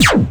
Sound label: noise; Giga Samples Collection; Johnnys Rap Tool Kit